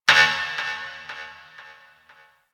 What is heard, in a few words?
Ship Energy Knock; Warframe; Corpus Ship Remaster